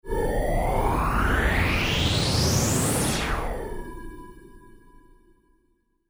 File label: Space Sound